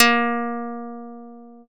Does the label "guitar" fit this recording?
Yes